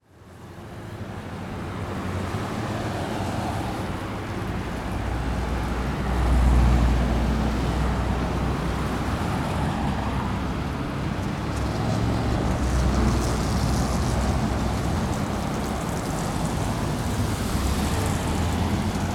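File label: sounds of cars, traffic jams and car traffic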